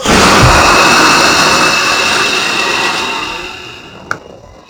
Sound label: jumpscare